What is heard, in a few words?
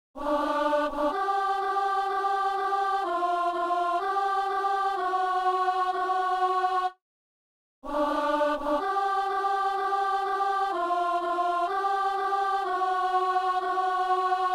alto; Messe de San Lorenzo - Gloria